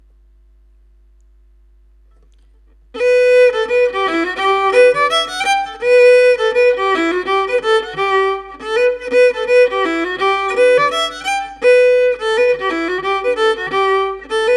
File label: morning star, solo fiddle